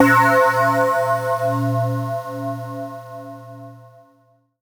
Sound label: C#, BELL GONG, Synclav FM; Big Fish Audio